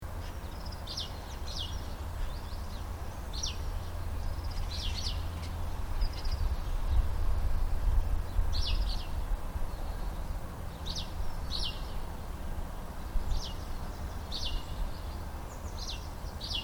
Garden (Residential): Ambience, Birds, Slight Road In Background, Morning; Outdoors; Backgrounds; Outdoor Sound Effects; Stuart Duffield